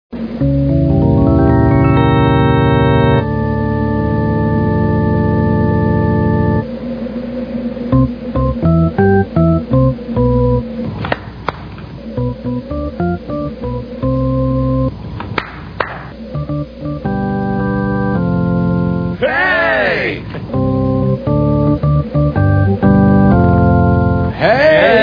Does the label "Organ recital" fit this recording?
Yes